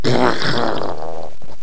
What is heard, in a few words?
mummy die; megaglest